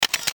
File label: Multi Shutter Sound; LG GS290 Cookie Fresh; Camera